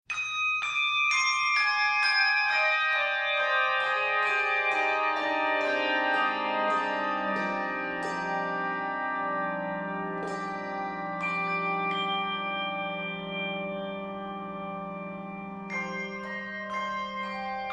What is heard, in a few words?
sakura melody fanfare